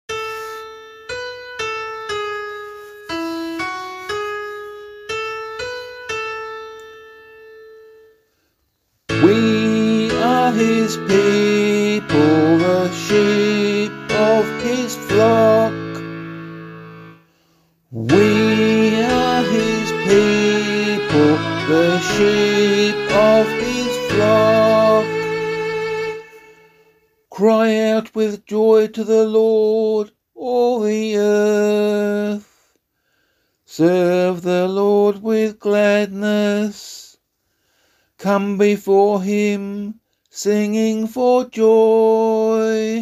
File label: Easter 4 Psalm C - vocal